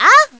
Ah; Minish people saying "Ah?"

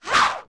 Attack Swipe; Gerudo

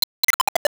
Crumble; Master Stalfos crumbling into pieces

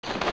Lantern; Poe; zelda; OOT

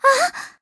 Inlove Gasp; Peatrice (in love) gasping; Skyward Sword; zelda

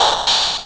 Splash; Tingle splashing in water

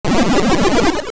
Transform; Vaati Reborn; Zelda; MC

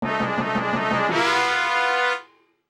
Fanfare Catch Non Fish

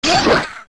Stalchild Die; zelda; OOT

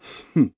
Hm Gloat; "Hm!" (Ghirahim gloating); Skyward Sword

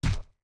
Step

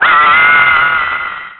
Fall; falling off a platform; Link falling off a platform; zelda

